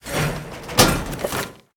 Book Present

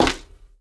Maint On Stone Walk; moul

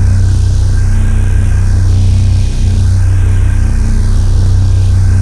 Big Wall Imager Loop

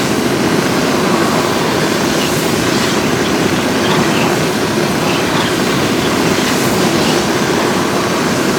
Steam Lamp; moul

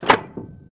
Drain Lever Power Off; tldn

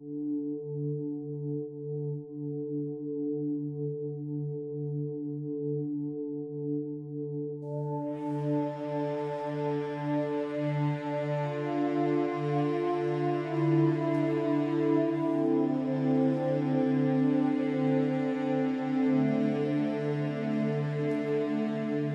Music; psnl Vee Tsah

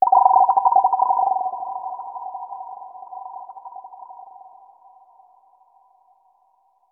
Forest Amb

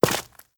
Stone Walk; moul-assets

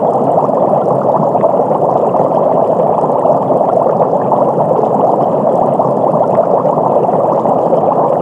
Aquarium Bubbles Loop; moul

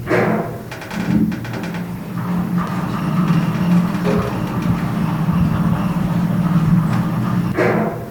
wagon rides; Mirtahm

